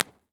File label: Bandshell, Impulse Response; Naumburg; EchoThief Impulse Response Library